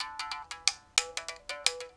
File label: mouth bow, Africa; Roland LCDP11 Africa VOL-1; S.MouthB Phr